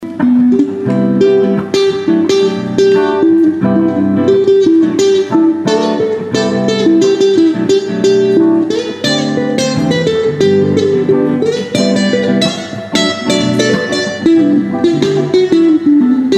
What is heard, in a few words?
bandono solo